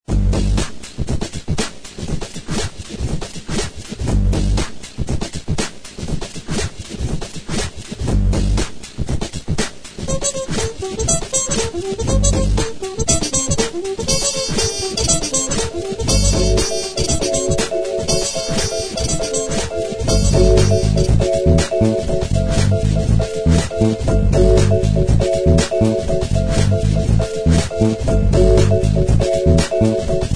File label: Jam Skating